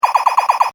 Toy raygun loop, Toy, Small: Ray Gun Loop; Toy Guns; Toy Gun Sound Effects; Toy Guns (Sound Effects:Toys); Stuart Duffield

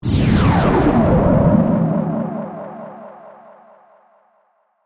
Hit Distortion; Sound Design; BlastWave

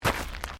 Footsteps Gravel Step

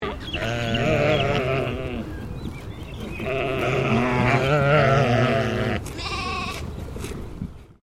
Animal Sheep English Leicester Baa; Sheep at Collingwood Children's Farm

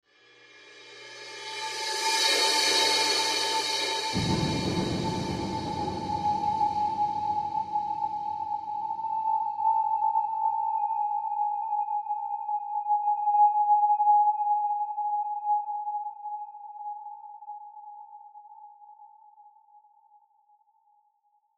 Horror Swell Cymbal Dissonant Tonal; Scary Tones; Sound Effects:Horror

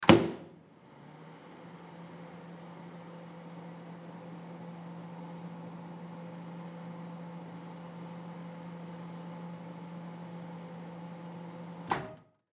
Microwave Door, Microwave Door Open; Household; Microwave Door Close Start Run Door Open Small Appliance

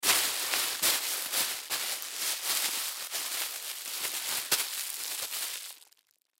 Bag Crumple Grocery Long; Rustling Sound Effects; Foley; Objects; Blastwave

